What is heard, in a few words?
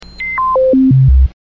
Radio signal